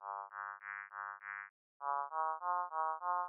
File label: bright pitch same